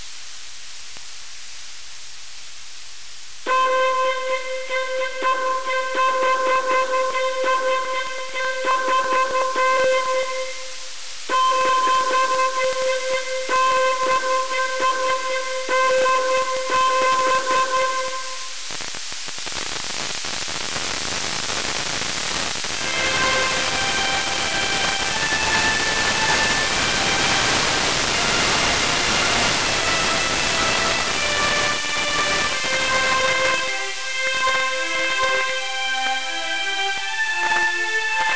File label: HORYU-4 DigiSinger; JE9PEL; Japanese Flag